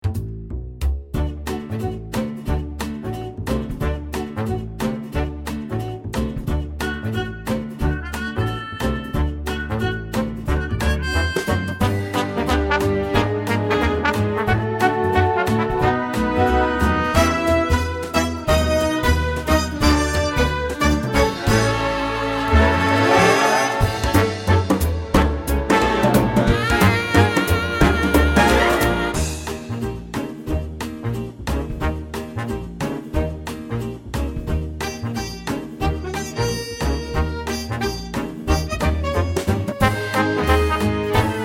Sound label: outro funny jazz